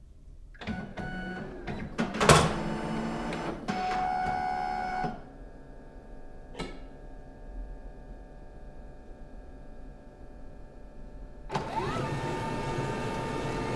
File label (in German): laser drucker